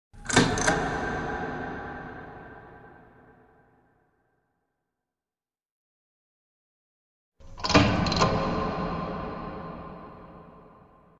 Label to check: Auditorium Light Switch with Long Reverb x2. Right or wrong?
Right